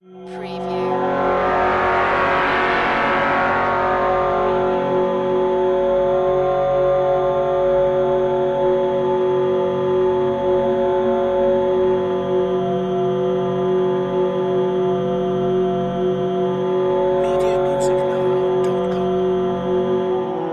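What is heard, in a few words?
Production Element Bed Synth; Sound Effects Bible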